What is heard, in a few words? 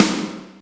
Perfect Acoustic Snare Sample G Key, snare tuned to the G note; snare drum samples